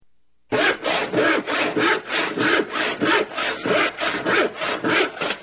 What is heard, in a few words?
sawing; Machines